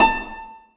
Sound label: harp a5; Sonatina Symphonic Orchestra